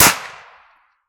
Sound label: glock; weapons; glock18; Counter Strike Model Pack; CS2